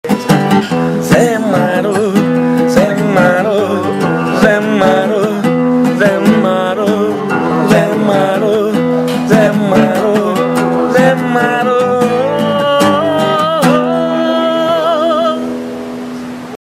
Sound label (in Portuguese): vinheta cantada